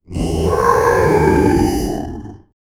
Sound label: deep guttural demon chant